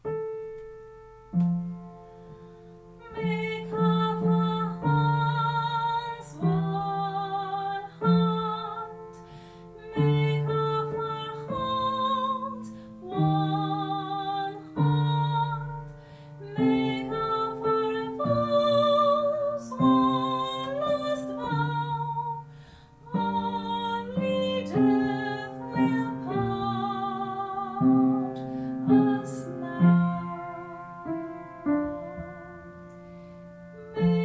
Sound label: soprano; west side story